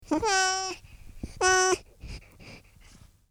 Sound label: Miaow; Yet another complaint with waffling; Kitti